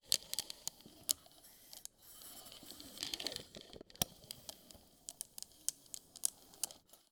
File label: measuring tape raw